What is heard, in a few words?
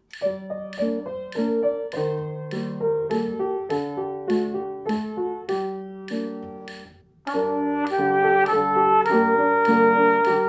Trumpet piano